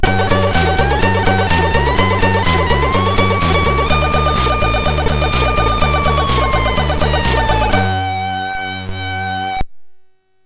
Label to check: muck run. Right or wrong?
Wrong